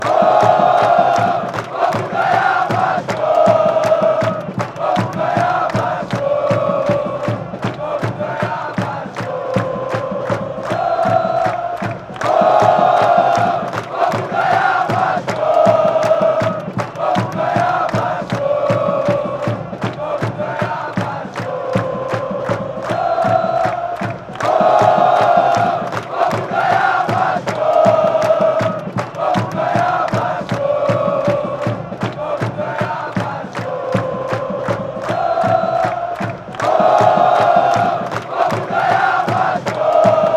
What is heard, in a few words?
vasco da gama chant